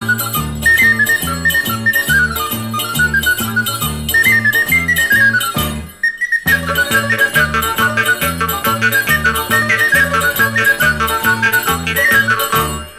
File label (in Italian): Sicilia Musica